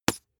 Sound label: bath duck hit hard